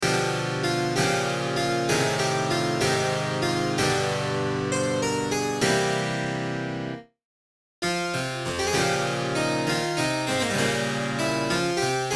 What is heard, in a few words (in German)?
tanze fur clavier f moll; bremische tanze fur clavier iii